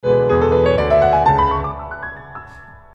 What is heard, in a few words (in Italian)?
piano italiano